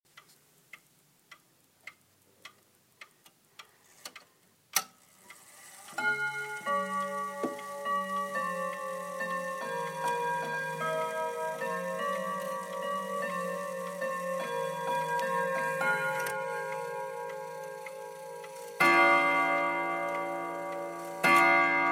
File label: ave maria de lourdes chime